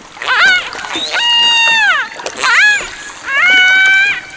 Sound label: Transient calls